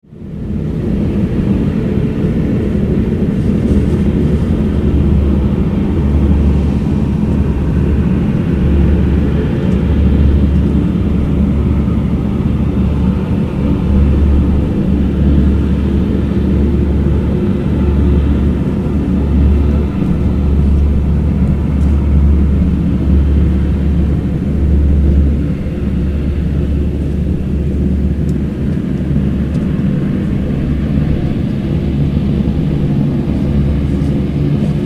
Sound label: pier